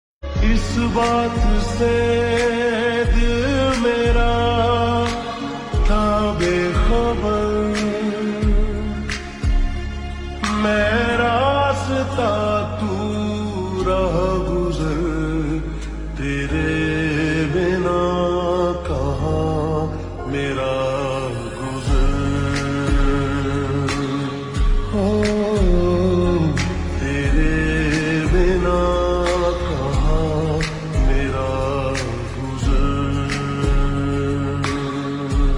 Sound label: Slowed Reverb